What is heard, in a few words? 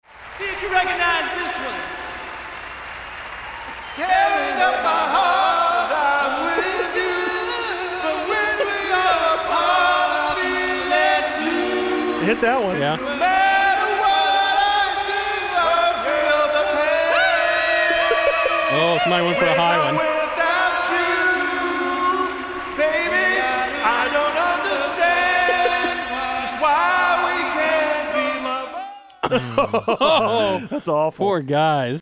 acapella